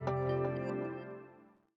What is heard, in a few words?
Boot Game; PlayStation 4 System Software Sounds Effects